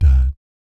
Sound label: DAT C2, Vocal Planet Jazz Fx, JAZZ COMBO; Spectrasonics; Vocal Planet Vol.3 Jazz Fx